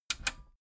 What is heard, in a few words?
metal button radio click disable fast